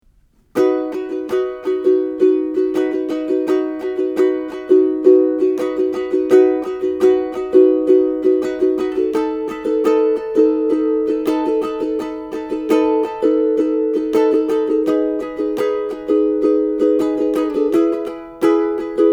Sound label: Verse Strum, ukulele